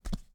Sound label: Walk; SFX Necromancer; Portal Quest; Android